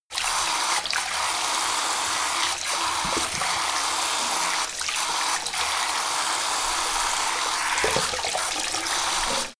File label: sink forced flow wash; haus garten; badezimmer